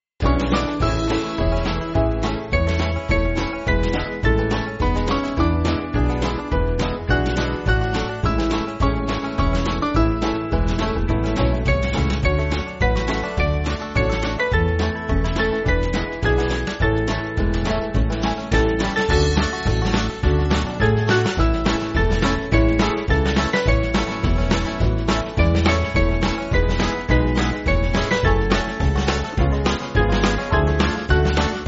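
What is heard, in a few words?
Band, Music Backing Files for Churches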